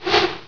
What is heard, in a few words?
fall; assault